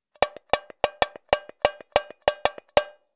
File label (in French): Tamborim baguette